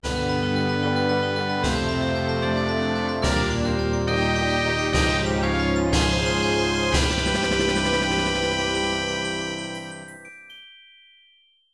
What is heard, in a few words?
Medal Get Fanfare; Nintendo 64; Legend of Zelda, The - Ocarina of Time